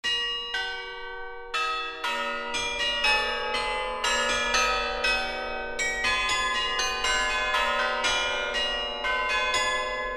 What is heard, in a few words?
Essay for Carillon in G Freygish; Twelve Essays for Carillon